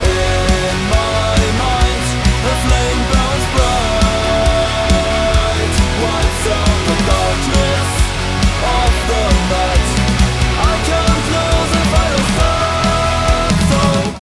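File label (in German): nachher, Studioqualität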